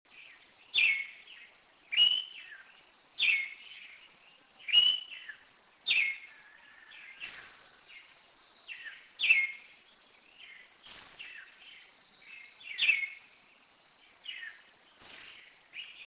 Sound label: turdus plumbeus aguas buenas puerto rico; West Indian